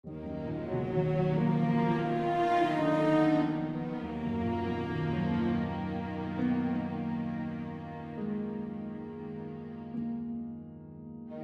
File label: music concepts another theme sad; mafia iv